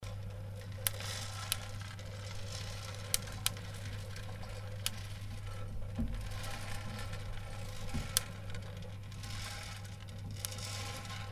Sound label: popping